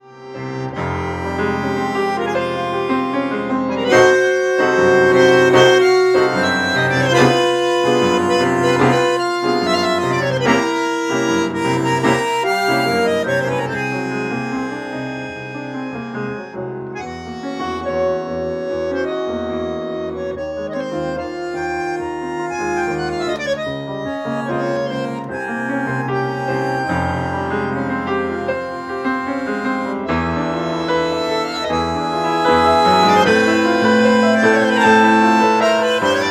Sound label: tango blues